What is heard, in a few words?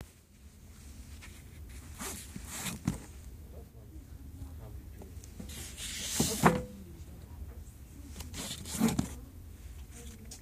shelving